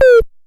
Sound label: Drop Hi, Stylophone Beatbox